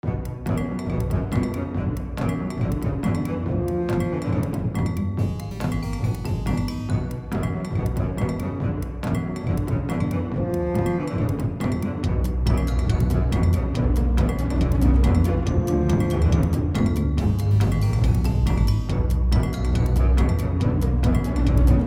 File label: Angry Broom